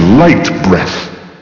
light breath; GDL

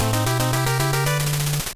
tune; Space Shuttle Exploration; Rinco

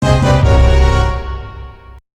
Fanfare; FFT

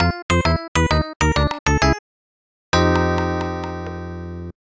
BGM

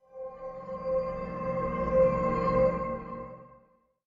forest; PSO2

